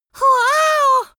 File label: amazed boy